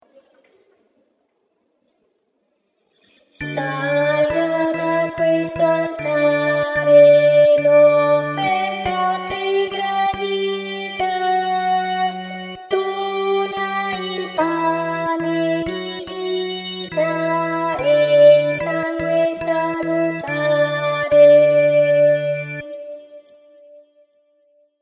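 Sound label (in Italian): salga da questo altare, contralti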